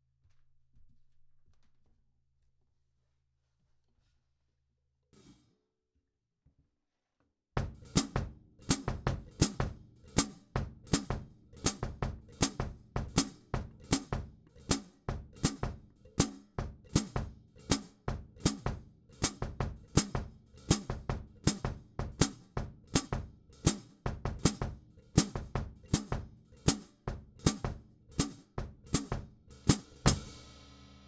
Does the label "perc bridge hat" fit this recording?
Yes